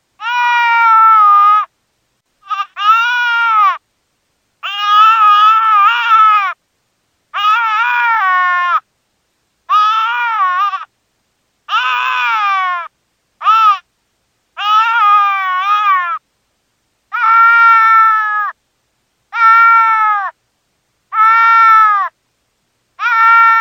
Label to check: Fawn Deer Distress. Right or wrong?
Right